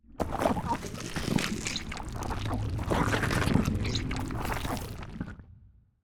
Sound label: sea monster eat